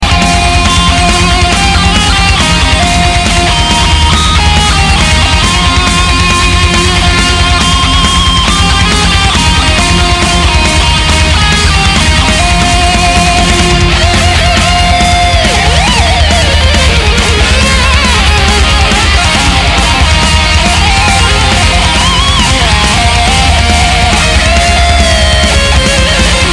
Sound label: Metal Guitar